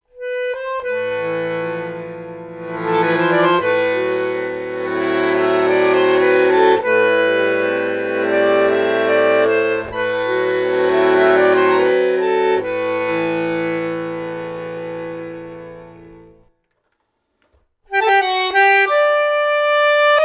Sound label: solo